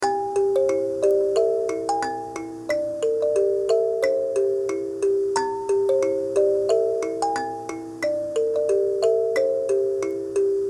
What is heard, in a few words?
KALIMBA